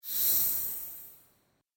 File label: Sand Swish, whoosh; Andrew Fleming